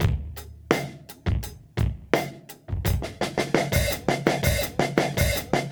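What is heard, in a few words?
84 bpm, beat loops; Zero-G; Monster Beats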